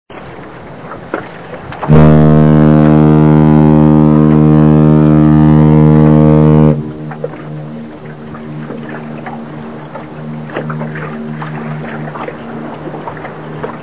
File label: Ship Horn